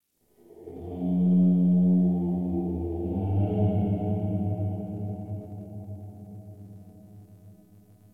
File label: Metal Groan, Metal Groans; Warframe; Locker Room